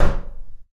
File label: wood door knock